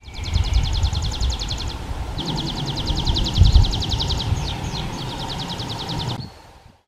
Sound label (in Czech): hlas zvuky zvirat